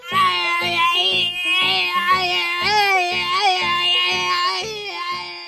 screaming

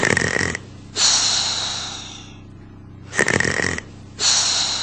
sleep; roblox

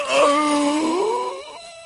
death scream; leon

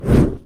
fire whoosh

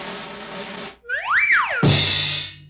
drum roll slide whistle rise fall and crash

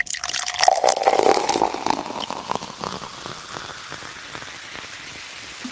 water pouring

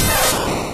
cry 8 bit; pikachu

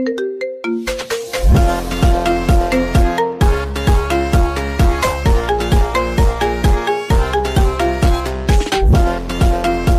ringtone phonk

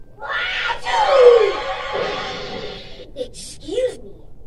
sneeze; bubble guppies

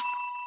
service bell

